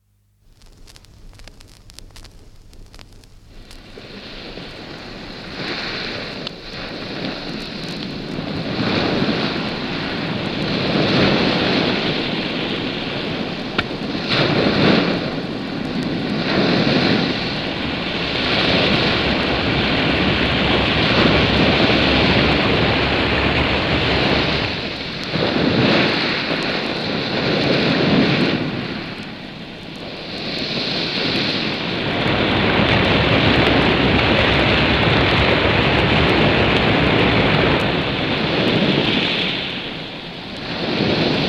Heavy waves breaking on shore, with sound of shingle (from 78 disc); Ocean Sound Effects; BBC